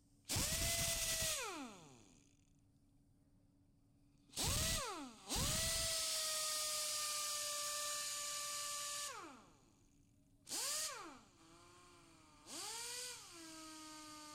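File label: Impact Wrench Bounce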